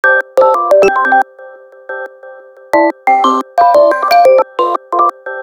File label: Alarm; Sharp; AQUOS sense10 SH-M33